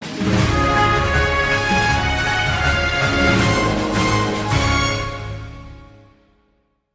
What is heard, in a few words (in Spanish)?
Tema de Victoria; Kingdom Hearts; SSBU